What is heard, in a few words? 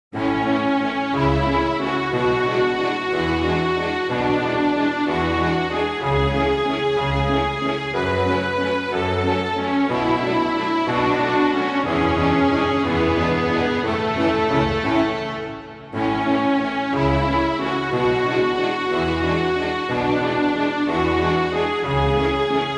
waltz; waldteufel; スケーターズ・ワルツ(主題部); ワルトトイフェル